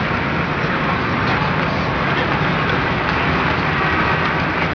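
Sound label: city ambience; Microsoft Graphics Studio; Home Publishing 99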